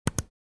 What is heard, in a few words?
Like and subscribe button